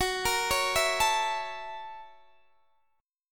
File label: Gb 7th Sharp 9th, Guitar, Open D; chordshape; down